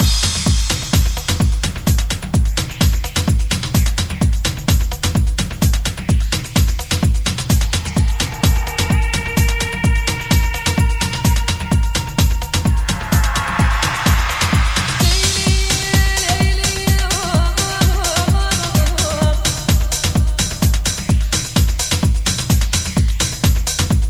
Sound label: pcmusic; cstrike; cs xemmas